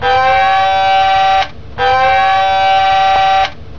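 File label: dive alarm, navy; Submarine - Diving Alarm - AN/WIC-2 (Dynalec) - USS Dolphin (SS-555)(Electronic alarm)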